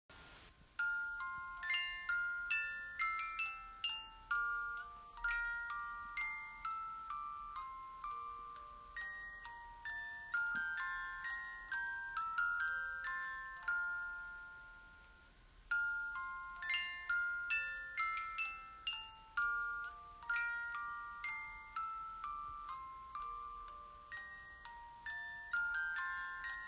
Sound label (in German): Spieluhren